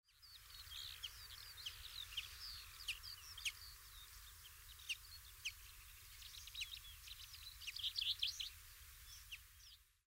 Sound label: chaffinch